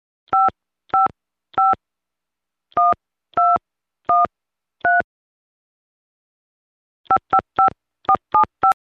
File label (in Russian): telefon